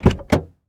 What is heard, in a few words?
Car Door Open; Cars